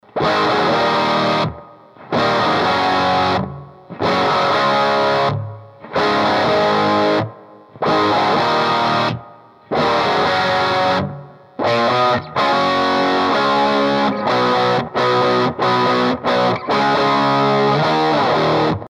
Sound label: marshall no effect, guitars